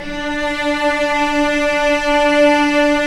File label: STR VC MF, Vcs mf vb; String Master Series